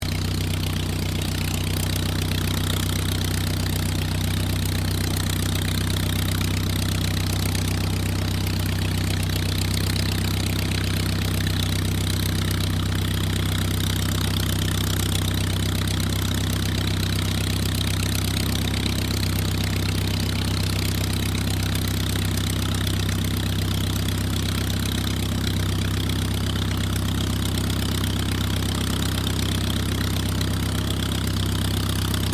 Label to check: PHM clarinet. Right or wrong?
Wrong